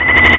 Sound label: shock; BZFlag; Action